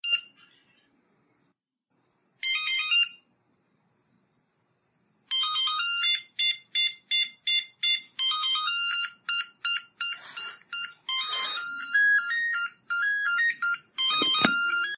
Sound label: song, nokia phone